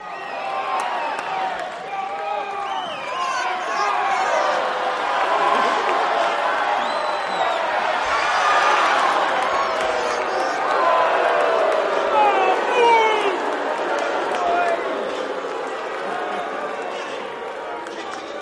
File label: Crowd Reaction Fight, boxing